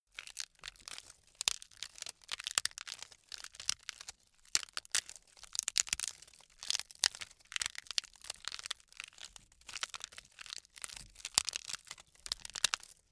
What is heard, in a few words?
bamboo crack sequence